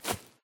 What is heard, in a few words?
grass step; Golden Crayon Sounds